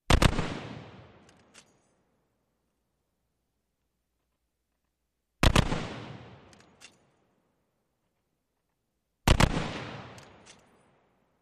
.50 Caliber: Double Taps, Reload.

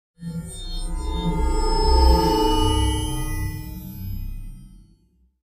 Glass HF Glass Reflec

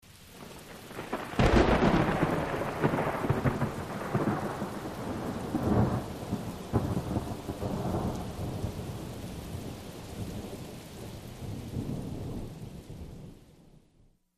Rolling thunder with light rain

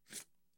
Cards Slide From Shoe

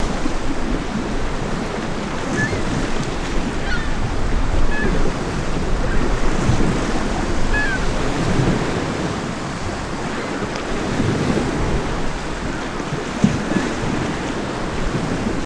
Beach Surf Ambience

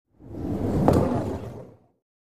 Bowling Ball Rolls

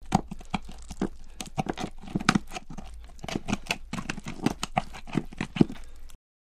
Body Mangled Machin, gore

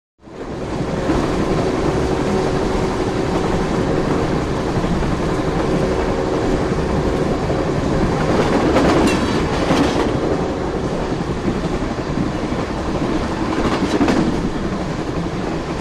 Train Exterior, passenger

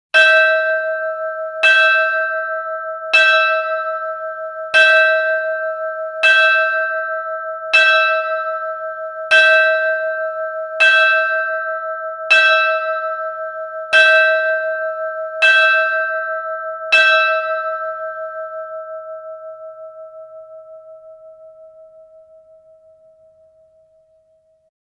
Church Bell Small D4, bell towers

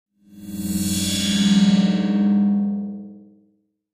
Logo HF Elect Wash Tre

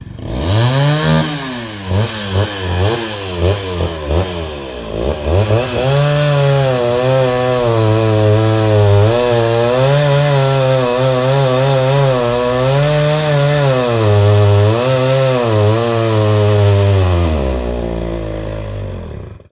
Chainsaw Strt Rev Th, chainsaws

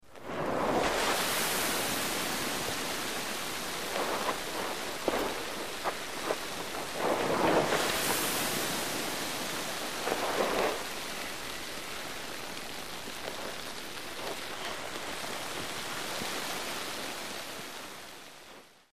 Sand Pebbles Pouring, landslide

